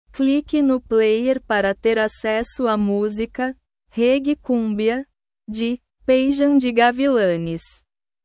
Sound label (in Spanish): reggae cumbia